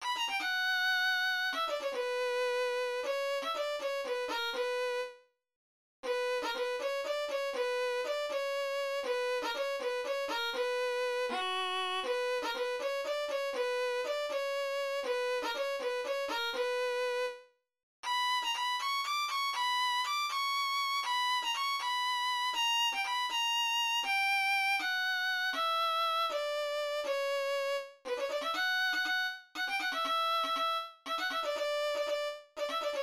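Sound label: violin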